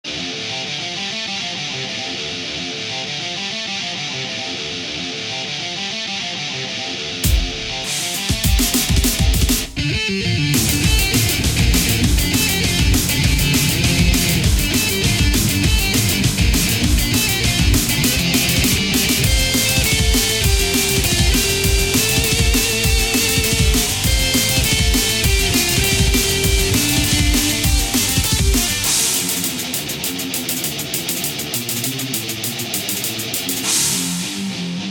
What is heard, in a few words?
Battle Theme